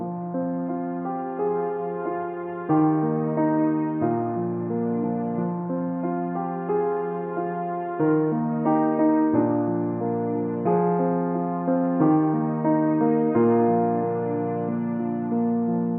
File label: Looping piano